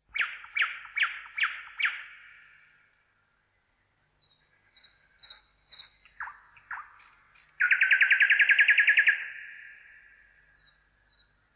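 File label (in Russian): solovej, nightingale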